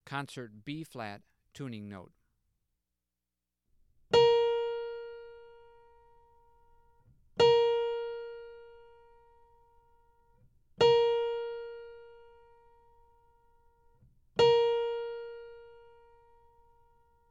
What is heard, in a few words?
Tuning Notes; James „Jamey“ Aebersold Play-Alongs